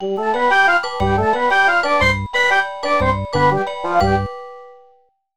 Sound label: Level Completion; SimAnimals; nds